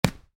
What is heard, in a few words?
Volleyball hit soft, Volleyball (Exterior): Hit Soft; Sports; Miscellaneous Sports